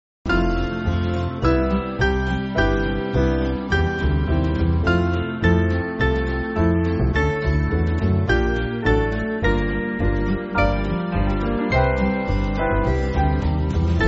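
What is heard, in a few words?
Band, Backing Files for Churches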